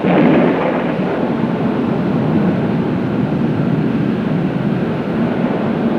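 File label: THUNDER; Best Service Advanced Media Trax Building Blocks; COUNTRY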